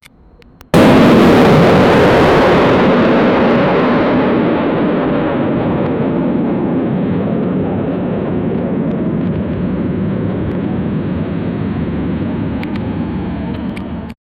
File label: an explosion that occurs, airplane